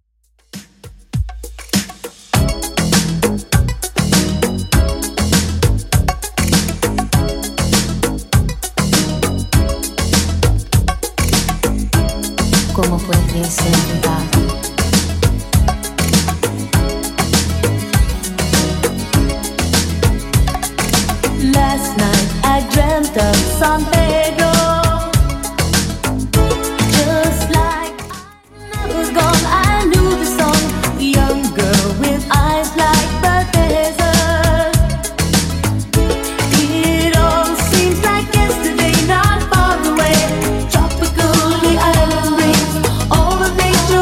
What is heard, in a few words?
80s Redrum 100bpm